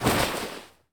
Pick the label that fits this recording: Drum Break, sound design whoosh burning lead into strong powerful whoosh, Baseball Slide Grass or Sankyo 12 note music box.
Baseball Slide Grass